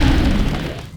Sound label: SIZZLER PEACE DAMAGE